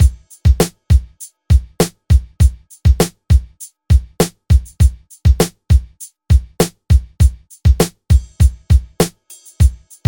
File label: Urban Witch Craft Ringtone